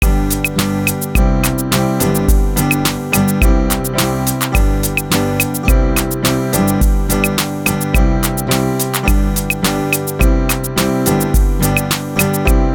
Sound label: Arriba Backing Track